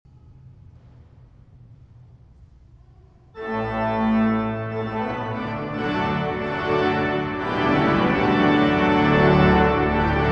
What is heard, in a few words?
Fanfare; op. 85; C.S. Lang